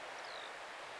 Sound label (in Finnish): kiurun tuollainen aani